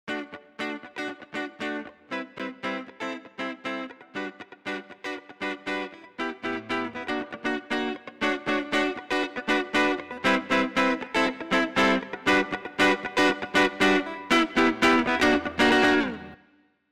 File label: DiGiCo S21 DigiTube